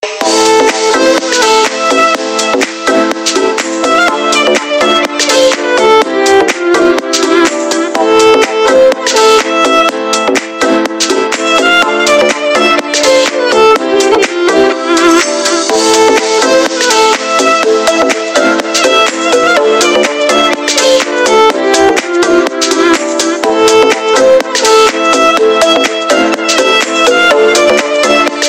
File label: Violin song